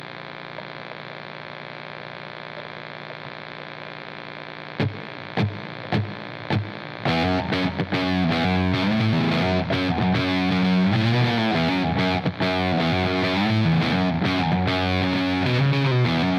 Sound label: riff